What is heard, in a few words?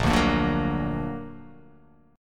A sharp Augmented; x,1,0,3,2,1,0,x